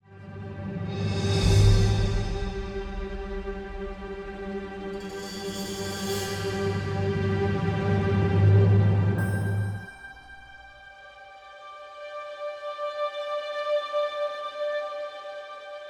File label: Forest Ruins Outro; bramble the mountain king; ps4 ps5 switch windows xbox one xbox series xs